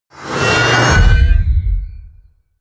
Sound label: promo pad; whooshes rises